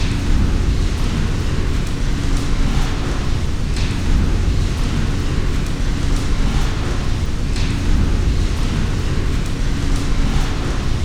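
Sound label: machine room ambience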